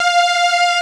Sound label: HI STRING C, JUPITER 8; Greytsounds VOL-1